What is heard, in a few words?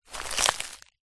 footstep forest floor crunchy leaves; foley